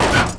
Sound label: CRASH; XBOX; IHRA Drag Racing 2004